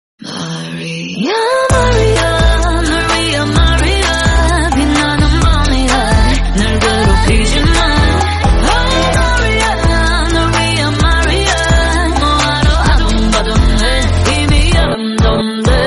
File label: Korean Song